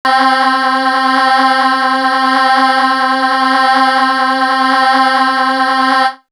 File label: Synth; AMG